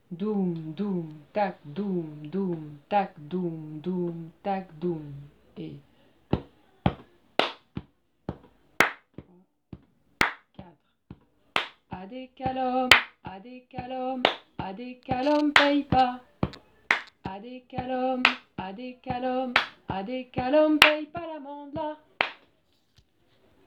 départ rythmique voix medium

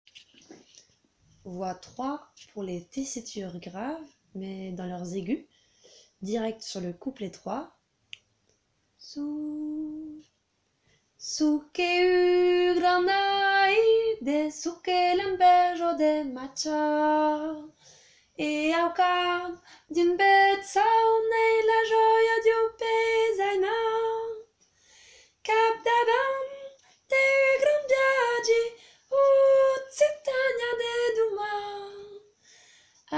voix pour les tessitures graves

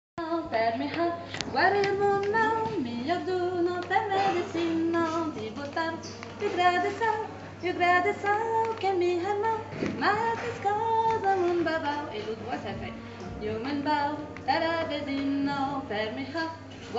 2 voix compilees

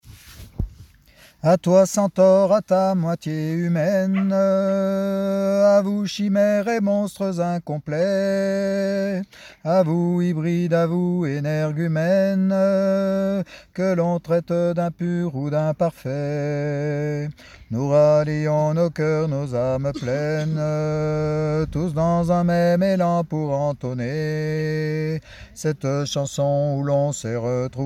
voix lead et basse separees

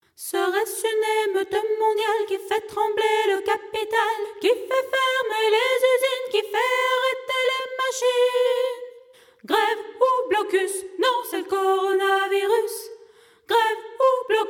VOIX HAUTE

